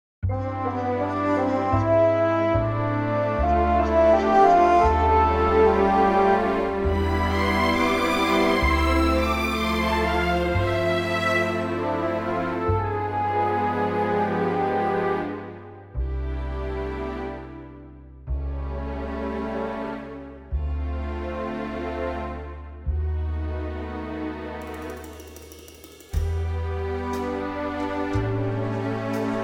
C orchestral; key - C - vocal range - A to D

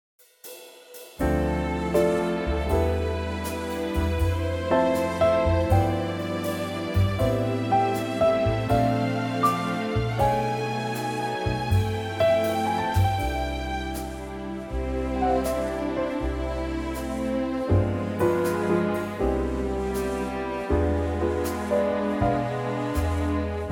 orch C

